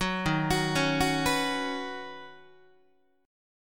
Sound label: D sharp Minor 7th Sharp 5th, Guitar; D#m7#5 arpeggiated; 4A,4E,5A,5D,5G#,6C#; 9,11,9,11,10,10